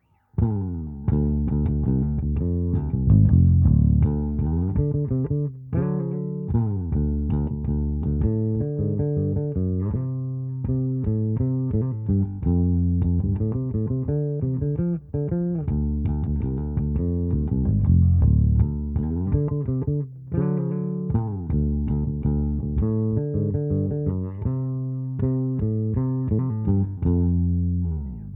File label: Flying V no horn; LWA500